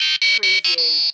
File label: Beep Multiple Beeps; Sound Effects Bible